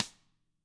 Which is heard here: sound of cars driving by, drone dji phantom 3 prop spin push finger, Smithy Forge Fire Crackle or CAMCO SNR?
CAMCO SNR